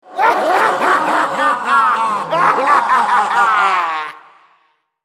laugh; Thresh; en US

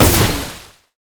hydroblast; nami base

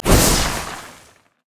q dash; vi; LoL

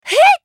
spell cast effort

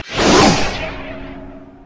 yasuo base crit attack; LoL

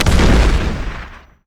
sonic boom; Cyber Ezreal

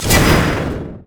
crescent sweep; Xin Zhao; zhaoyun

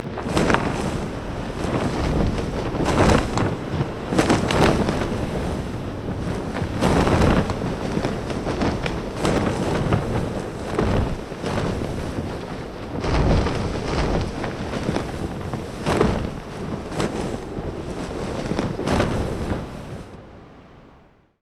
banner mid bridge hi; LoL; emitters map12

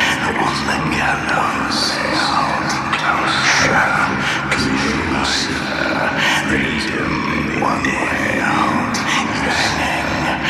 altar unlocked capturing; LoL; altars

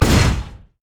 steam golem basic attack; LoL; blitzcrank

